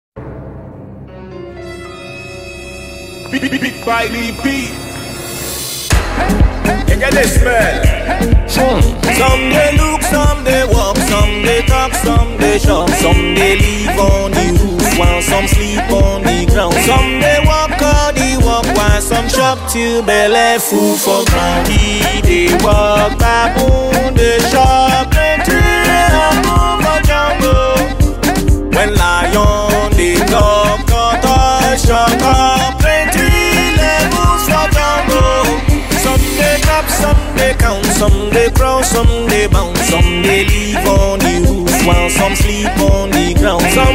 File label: Naija Jingle